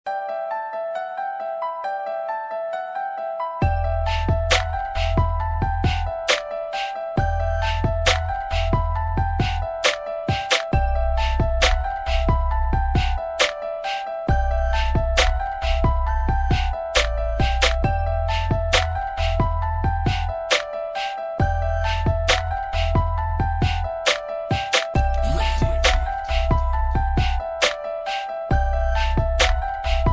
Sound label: Anime Music Ringtones